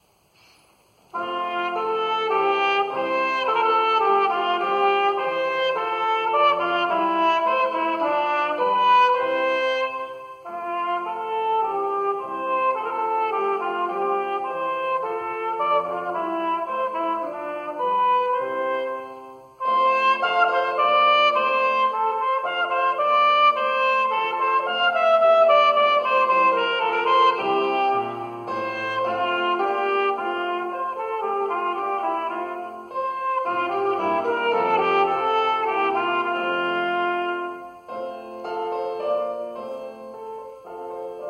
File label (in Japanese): トランペット, ソロ; ソロコンテスト大阪大会; ♪調子の良い鍛冶屋